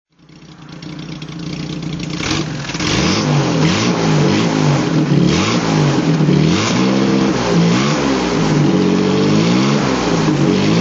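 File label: jaguar xk150s rally throttle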